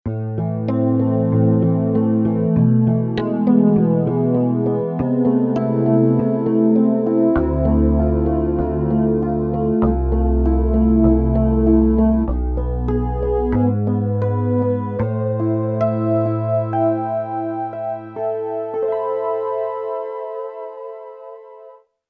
Guitr Rhodes, Roland JV-880; Factory Patches

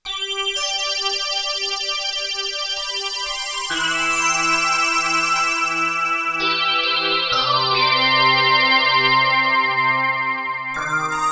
Res Bell, Roland JX-8P

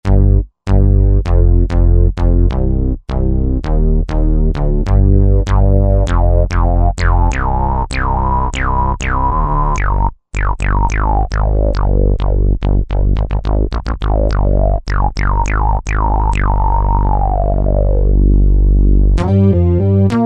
Mondo Bass, Roland JV-2080

